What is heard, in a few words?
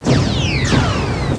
tank fire